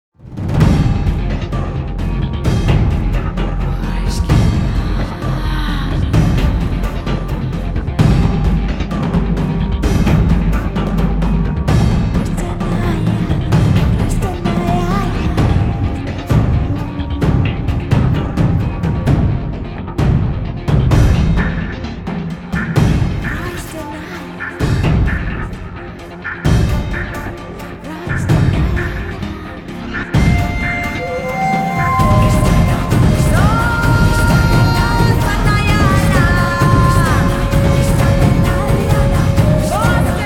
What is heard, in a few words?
world percussion; evolution series world percussion; best service